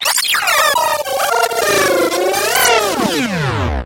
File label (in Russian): zvuk peremotki plenki